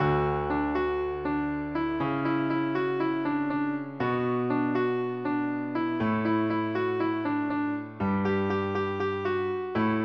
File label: piano fast; fast tempo; PSE Music; PlayScore version